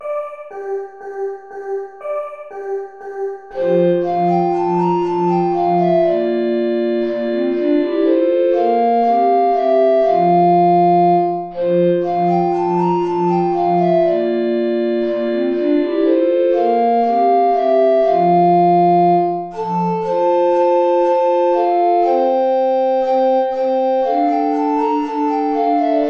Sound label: play along without alto recorder; steuerlein johann; mit lieb bin ich umfangen